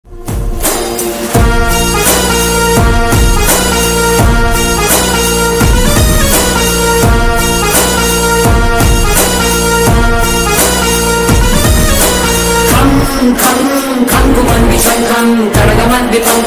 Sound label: bgm ringtone instrumental; akhanda